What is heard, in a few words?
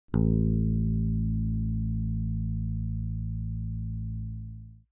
Bass Picked A